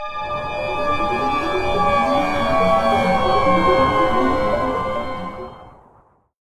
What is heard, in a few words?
Bell Warp